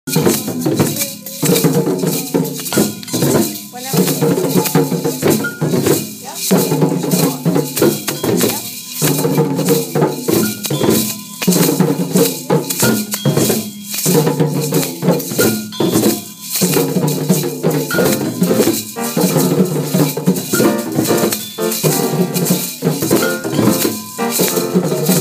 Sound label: drumming